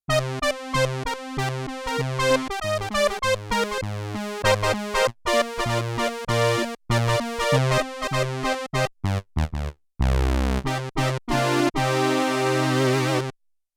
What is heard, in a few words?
Dual Prophet Brass, Prophet 5; Yamaha TX16W